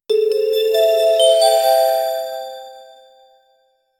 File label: gentle 3 4 second musical, notification